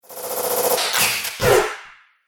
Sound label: Robot Factory Noises; Robot Sound Effects